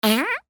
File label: Pass Gas